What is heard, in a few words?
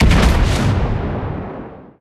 ambient port; World Of Warships; port3d